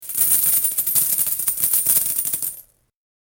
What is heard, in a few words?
collect coins; puzzleweave